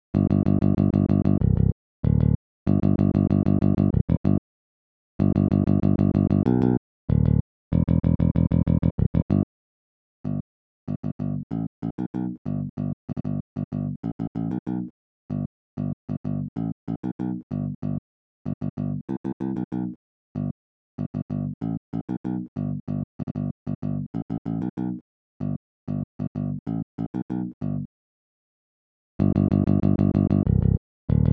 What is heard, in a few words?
bass